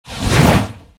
flyingwhoosh; SFX Monsters fetus

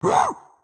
TripleSwipe WindUp; Caffiend Caelan; Going Under

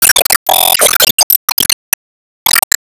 Enemy Evolution Active Hit

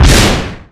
Enemy Fashion 1st Damage Shot

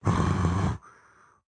Dash Attack; Caffiend Caelan

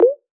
Grab

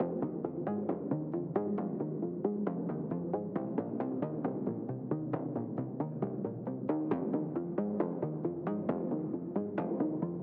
Basses 135; Arctic Oscillation

